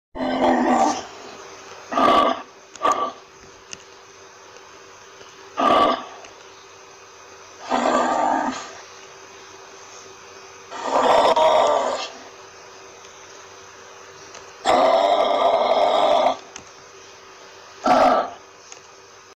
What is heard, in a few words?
Wallaby; والابی